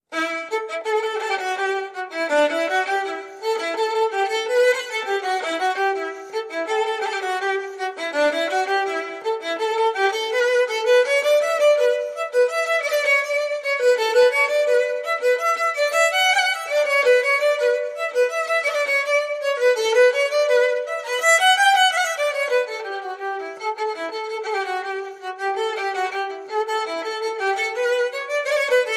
Irish Beer

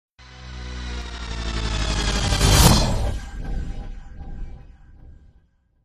Stutter Stab

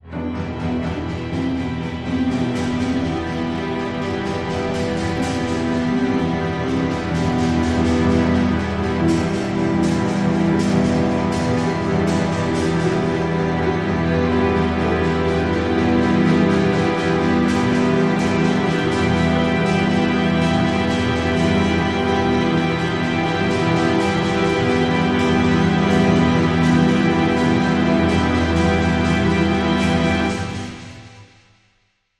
Industrial

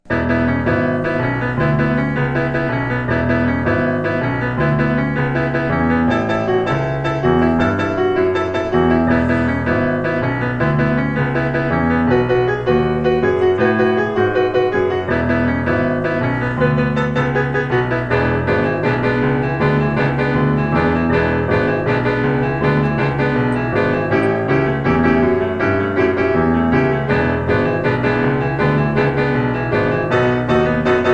Blues

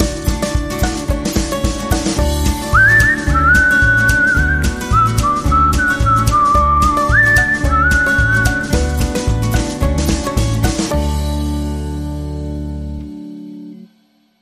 Whistling

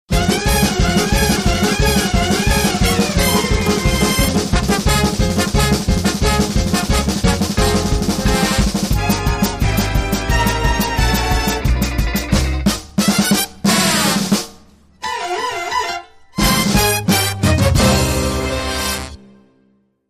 Cartoon Chase Sequence

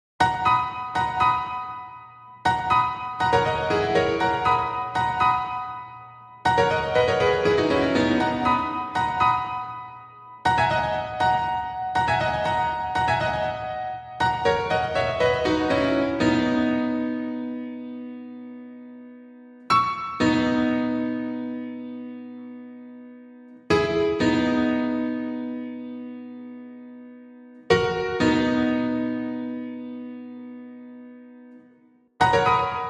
Piano Only

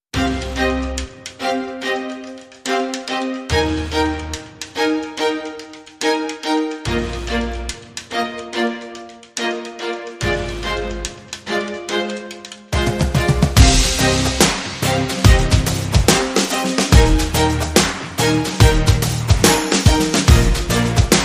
No Melody

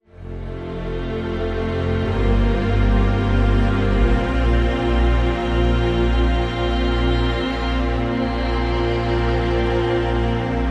Strings Only

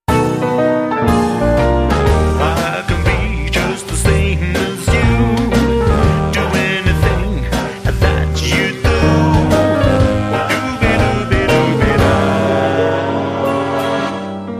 DooWop Choir

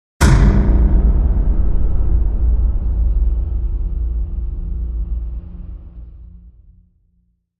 Hit Tonal Casualty

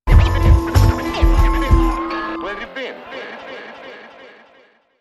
Sting

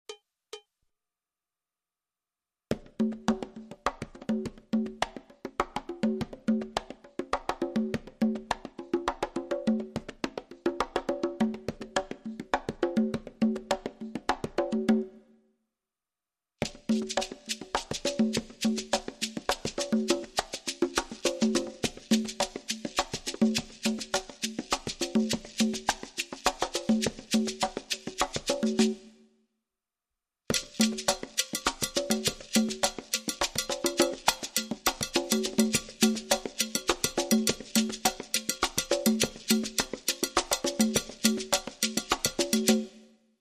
Afro Cuban Loop Conga Shaker Cowbell

